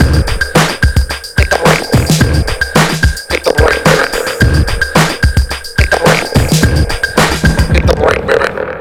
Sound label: DRM, Breakbeat; HITBREAK 109